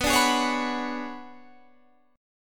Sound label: B Minor 6th Add 9th B down Guitar Standard; Bm6add9 strummed; JGuitar